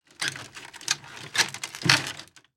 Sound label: Impact Human Get Off Cot; Impacts